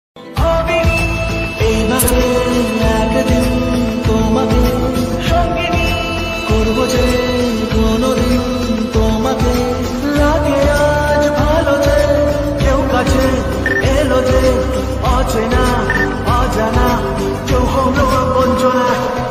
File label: Bengali Ringtone